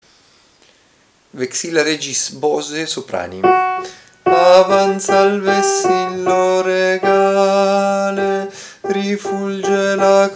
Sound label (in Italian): soprani; Vexilla regis Bose